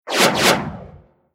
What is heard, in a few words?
Whoosh Sweep Rocket Flare By Fast Sweep Double Weapon; Sound Design FX; Descending